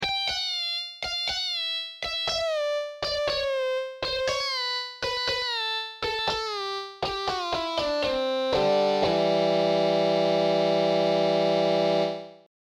blues; Steelin the blues; guitar player licks and lessons

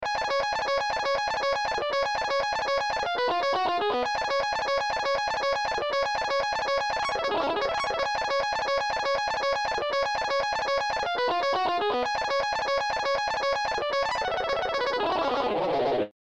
Lick; Killer Banshee; Coenen Marcel

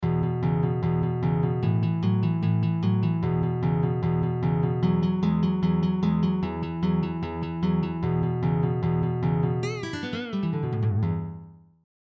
12 Bar Blues with Pentascale; Blues Exercises

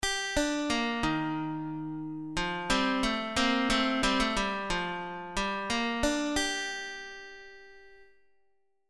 Guitar Pro Intro Jingle

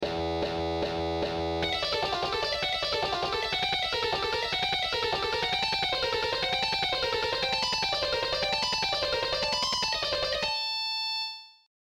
Skipping, Shredding Exercises

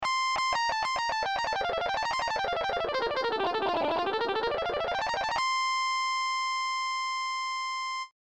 solo

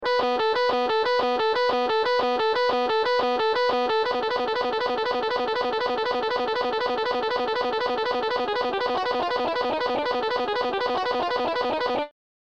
Tapping exercise on one string; Tapping Licks

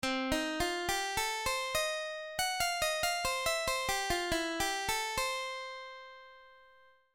Slow blues, Blues lick

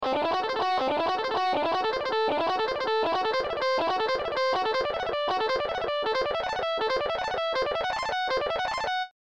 Am6 Licks; Am6 Pentatonic Shredding licks

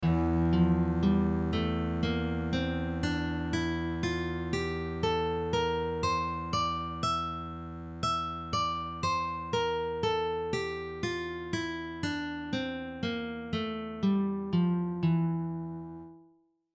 E Locrian; Scales and Arpeggios

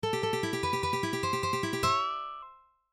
Aminor Tapping Exercise; Tapping Licks

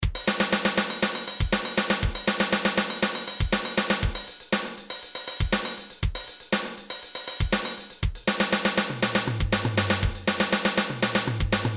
drum beats

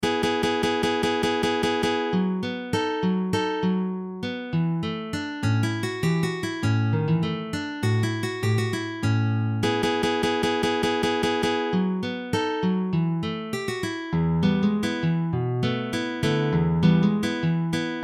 Mississippi river blues

